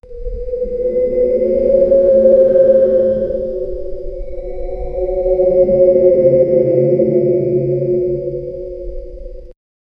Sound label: old haunted house ambient